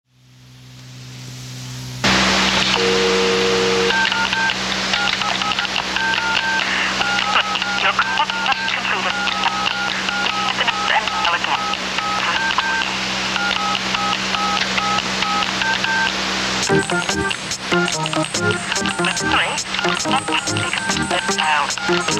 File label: funky phone